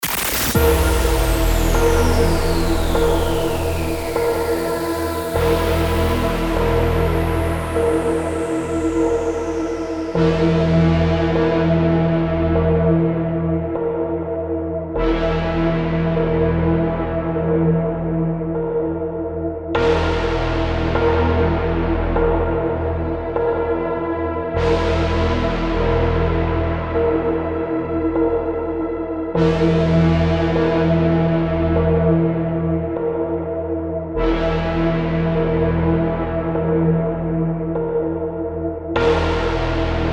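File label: drone blip on the radar 100 bpm E minor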